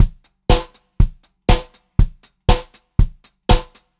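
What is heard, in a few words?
120 pop variation, Pop-Rock Drumloops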